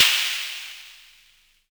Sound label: Snare, Univox SR-95